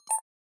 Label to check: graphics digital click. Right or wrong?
Right